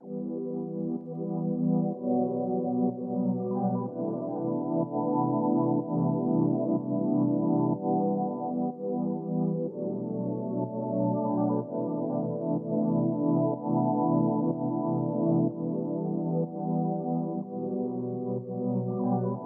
124 BPM E Min Pad; Cymatics; 2022 Melody USB Expansion; Heaven

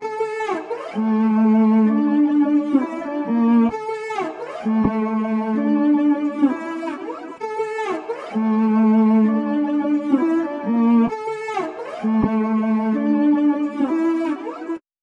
130 BPM A Min String; Melodies; Loop Stems; Melody Mystery Expansion; Smoke

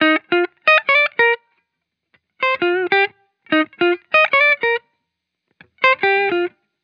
Vibrations Chill Guitar Loop 140 BPM D# Maj; Cymatics; Vibrations Guitar Sample Pack

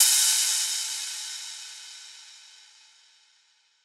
Riddim Crash; One Shots; Hats & Cymbals; Ghosthack; Riddim Essentials 2020

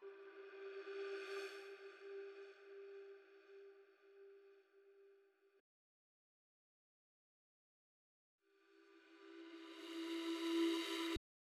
172 BPM B Min Reversed Ambience; RnB; Melody; Loop Stems; Cymatics; 2022 Melody Collection; Houston Dancing

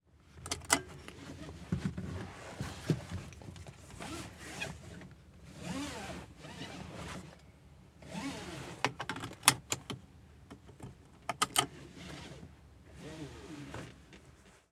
Seatbelt Car Movement; Fairlight Sound Lib

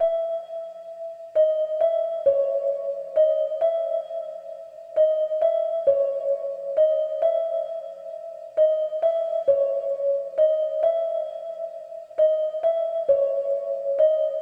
133 BPM C# Min Bell; Trap; Melodies; Loop Stems; Cymatics; Trap Starter Pack; Harm's Way

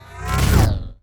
wooshes transform sweetener; Whooshes

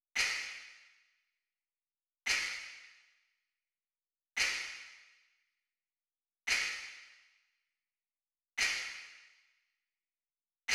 Drum Loop 114 BPM Snap; Loop Stems; Cymatics; Vibes II Drum Loop Collection; Toast

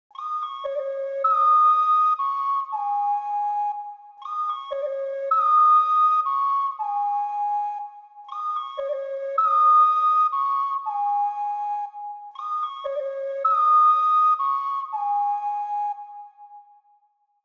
118 BPM C# Min Ocarina; Melody; Trap; Loop Stems; Cymatics; 2022 Melody Collection - Trap Pt 2; Last Fight

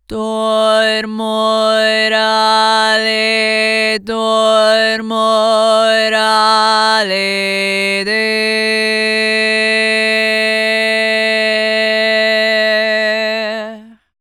Chant Vocal - Am 120Bpm; Construction Kits; VOCALS; Ghosthack; SkyRealm; Kit 05 - Heart Of Fire

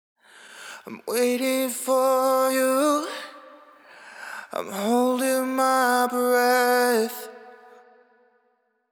Sung Phrase Wet - 128 BPM A Min, Vocal; Waiting; Cymatics; Euphoria Vocal Sample Pack

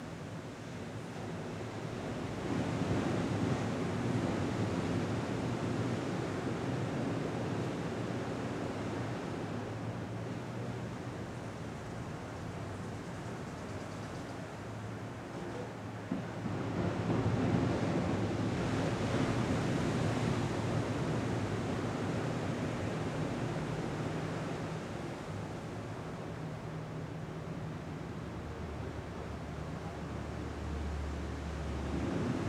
Ocean Waves Day From Balcony; Fairlight Sound Lib

